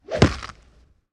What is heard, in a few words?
fighting swipe bone break